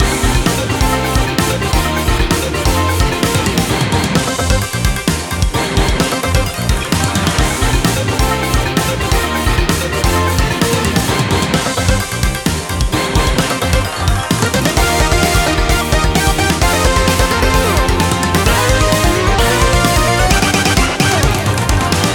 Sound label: Character Select Loop; TMNT